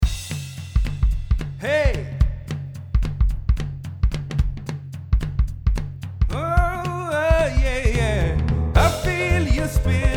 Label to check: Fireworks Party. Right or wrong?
Wrong